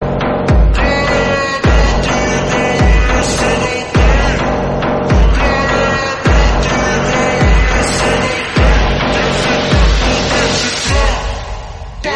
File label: orchestra; orquestra maldita theme